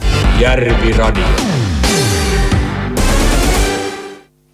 jingle; jarviradio

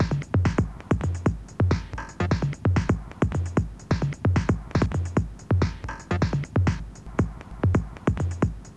beat

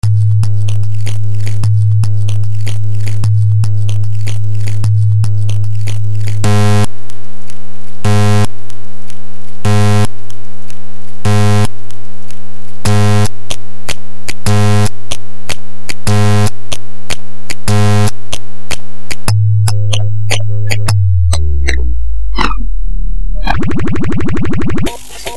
Tom Tom Oboe

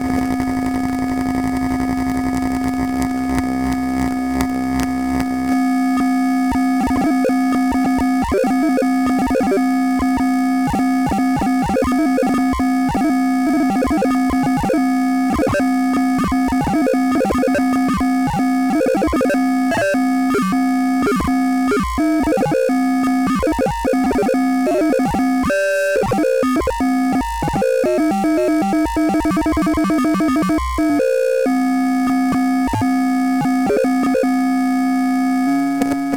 Keyboard

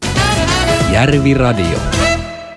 jingle; jarviradio

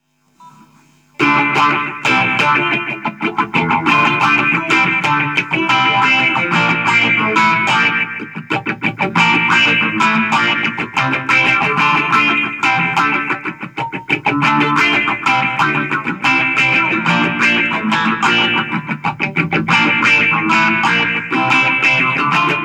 britrock bassman riff

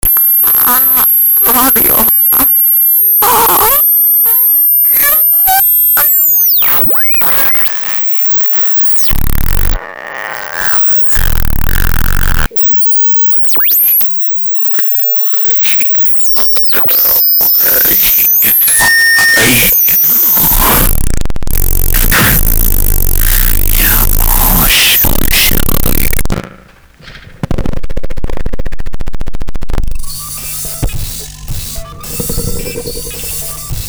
jingle

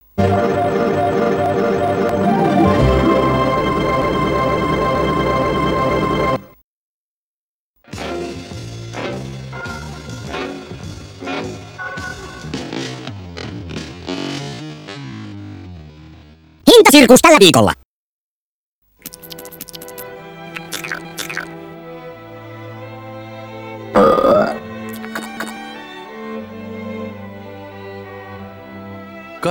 jingle; hintasirkus

